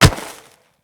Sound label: Step Snow, Footsteps; Kubrow